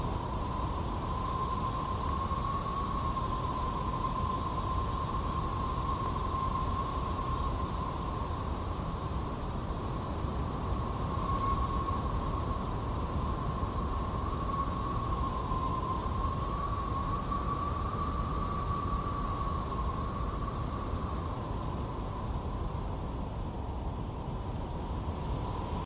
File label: wind; AssaultCube